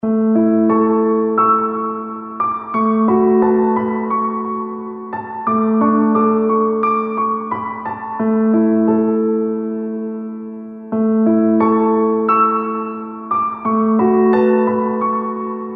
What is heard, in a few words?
piano; maoudamashii